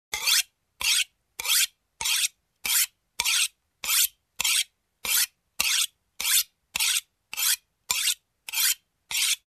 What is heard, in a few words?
Sharpening Kitchen Knife